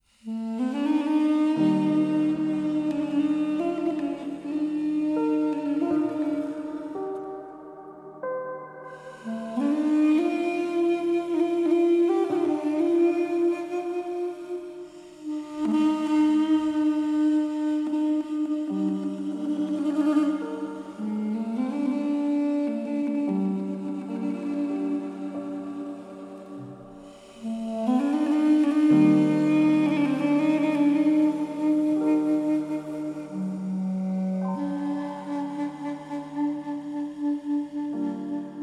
duduk 432 hz

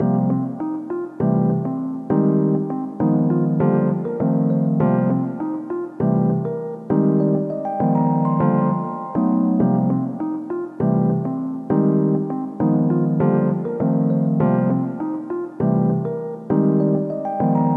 smooth jazz cafe

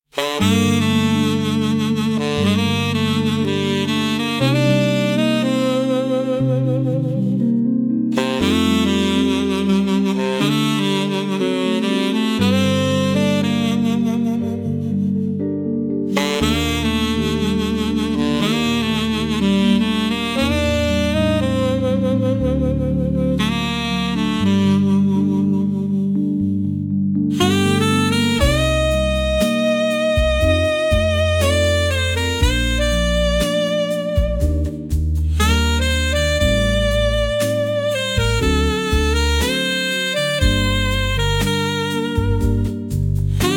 velvet saxophone

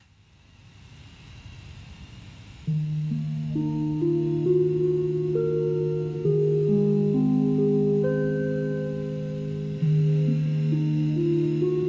Flute of Tranquility

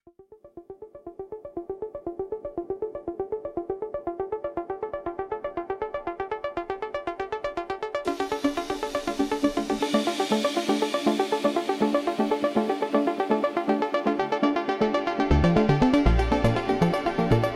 Electronic Impulse

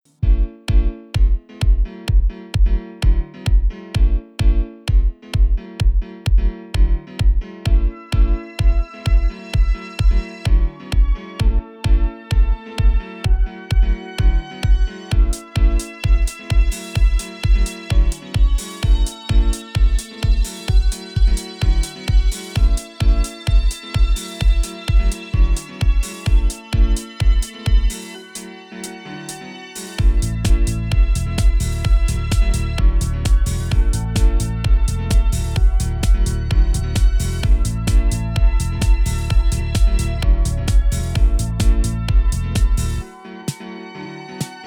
aerobic dance 432 hz; The joy of exercise 129 BPM

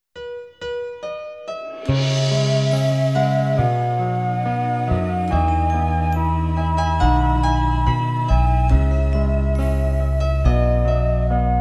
spa music, 432 hz

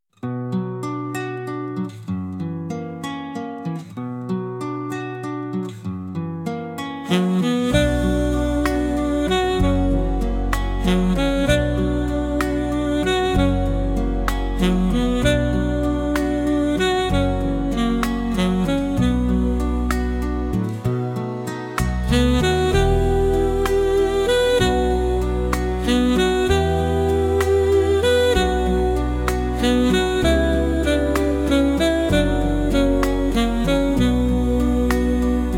smooth sax journey

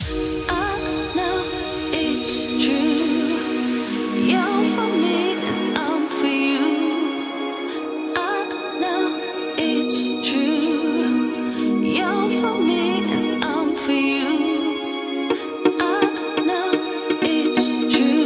happy music

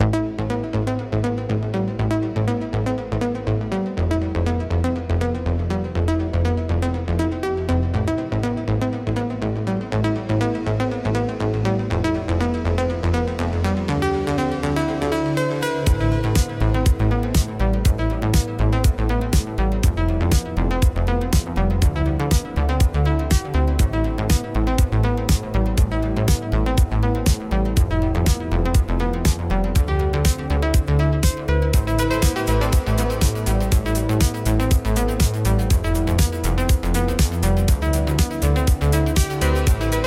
Electronic Impulse

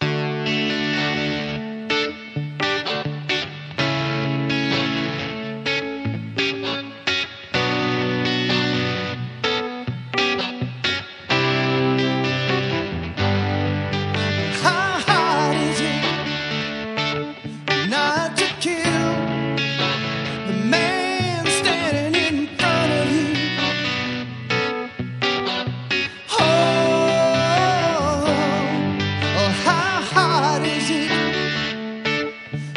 chillout singing dance 432 HZ

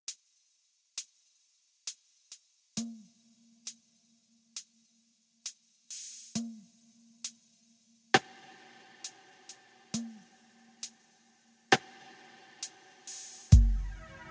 spa music 432 hz

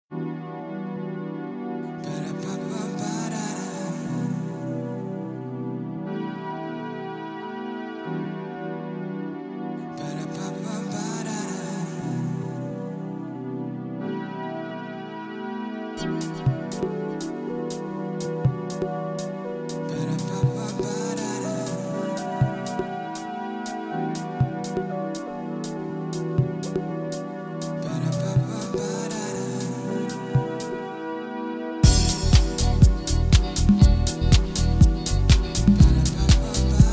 vocally 432 hz

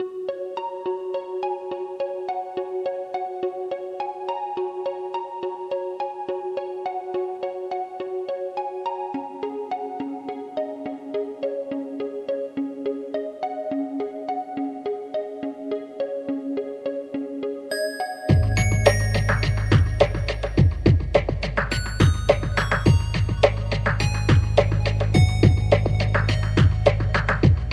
electro dance

